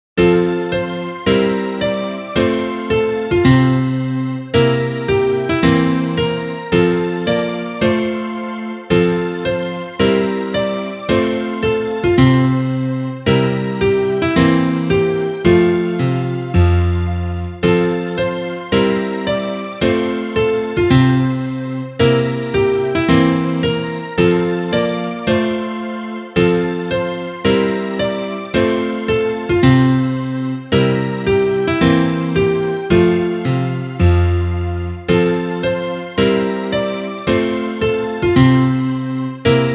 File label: instrumental